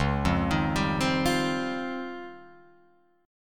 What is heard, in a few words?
Db Major Sharp 11th, Guitar Open F; arpeggiated